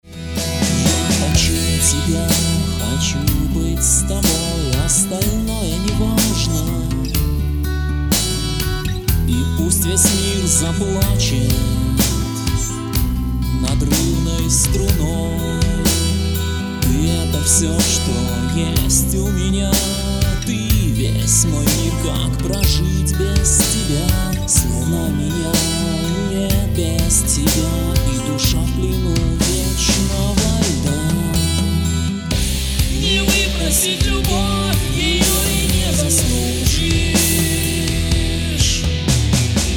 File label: Rock